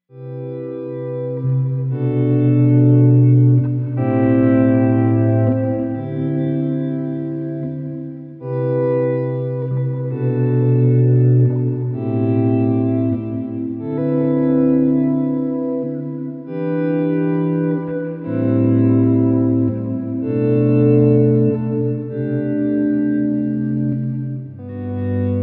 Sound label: swell; ventris